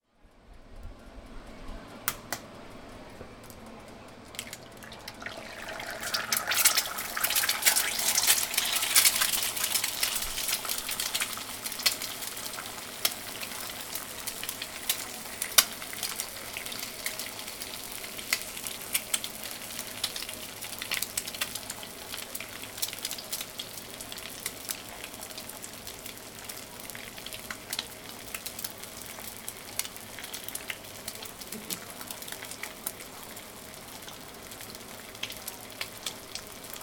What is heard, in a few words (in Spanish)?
huevo frito; bilbao; muga; soundfood